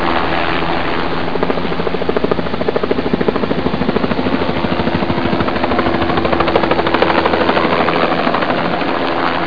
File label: copter